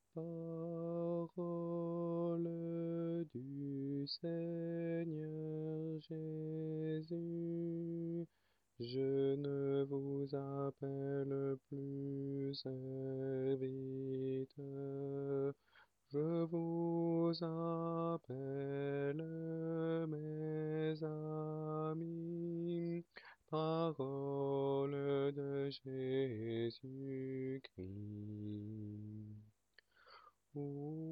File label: alto; Parole du Seigneur Jesus; mariage